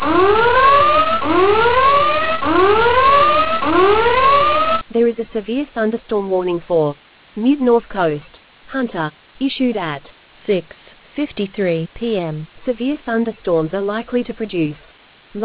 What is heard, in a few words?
storm audio